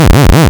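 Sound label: waveform FM sawtooth sine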